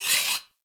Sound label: Moa Leg Movement; Enemies; Warframe